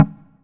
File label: Chord; ModelCycles